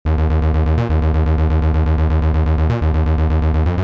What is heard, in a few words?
Pluck Melodic Techno Vital